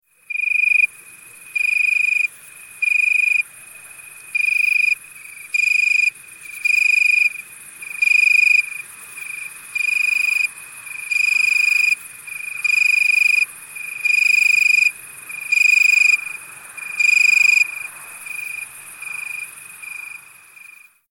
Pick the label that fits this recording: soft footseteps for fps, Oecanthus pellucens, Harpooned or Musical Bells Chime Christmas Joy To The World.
Oecanthus pellucens